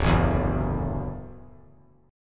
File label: D-Major Add 9th D down; strummed; JGuitar